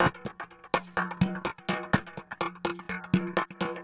PERCLOOP ETHNO 125

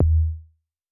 A2 Pure Tuned Kick, Tuned Sub Kicks; One Shots; Touch Loops; ElementsOne; Abstract Rhythms

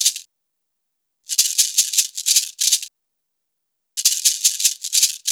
ETHNO PERCUSSION 90; ethno2

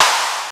SD NOIZ, snare drum; SNAREDRUMS

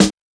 snare drum low tone; SNAREDRUMS

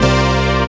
dance chord

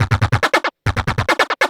Industrial 140

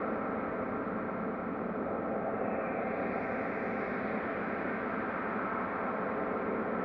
techno pad 140; Techno2; Keys

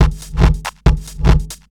DRUMLOOP TEKNO 140; Techno2

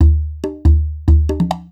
PERCLOOP TEKNO 140; Techno2

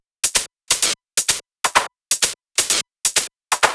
drum loop 128 Top; Anthemic

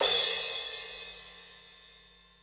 CRASH, CYMBAL; drum

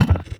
One Shot, Hits

